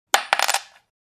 Single coin dropping on solid surface

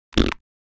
Raspberry mouth short blowing human

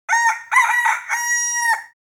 Rooster crowing

